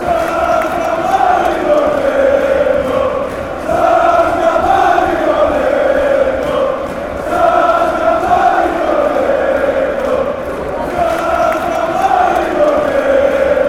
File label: panathinaikos chant